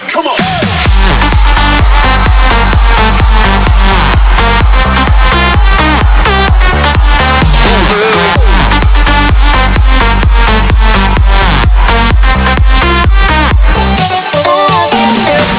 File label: music; jb community